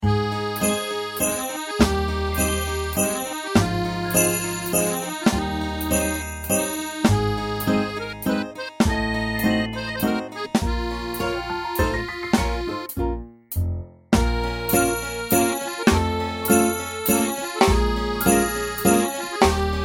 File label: waltz